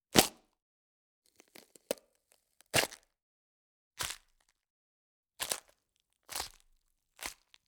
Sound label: GORE Fast Rip; Coll Anderson; GDC Game Audio Bundle